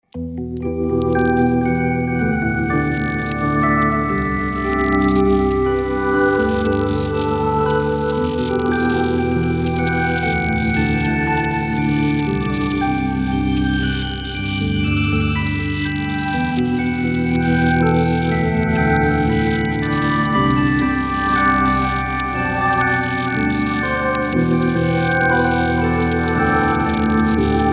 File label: wind